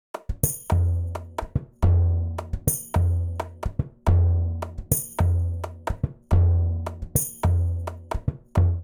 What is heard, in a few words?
Afoxe Tambourin & Surdo 107bpm, Latin Percussion; Ableton; Factory Packs